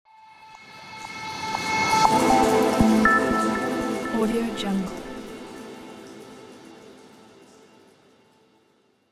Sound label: light logo